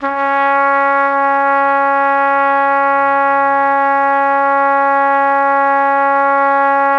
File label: C#3, Pop Brass; Best Service ProSamples vol.19; AKAI